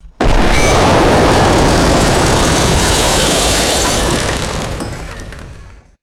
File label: heavy building collapse and debris settling; destruction